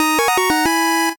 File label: 8bit se